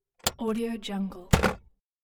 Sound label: glove compartment